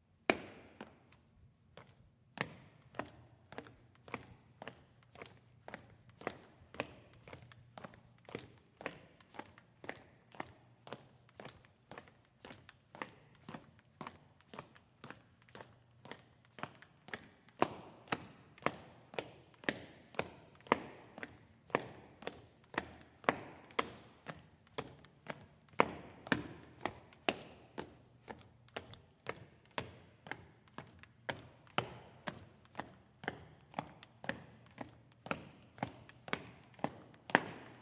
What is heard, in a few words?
Shoes on ceramic; کفش روی سرامیک